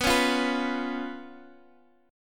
B Suspended 2nd Flat 5th down Guitar Half Step Down